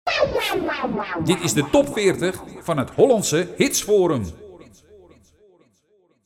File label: Top40 Tune